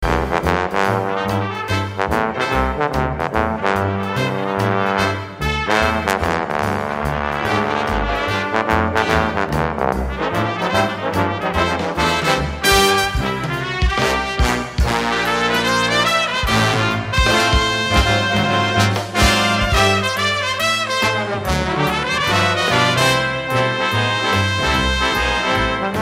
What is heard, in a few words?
choo choo